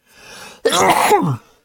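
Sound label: 50-year-old man sneezes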